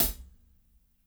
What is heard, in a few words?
CHH, ROCKER KIT